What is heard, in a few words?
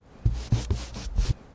Painting Roughly On Canvas